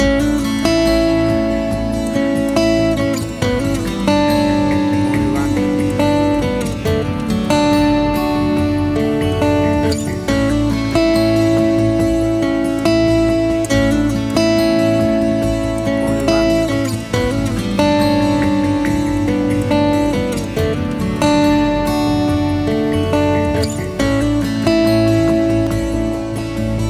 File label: Acoustic Guitar Moods